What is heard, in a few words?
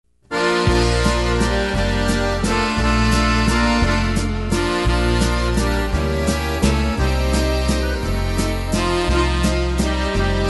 European Waltz